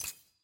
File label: button difficulty click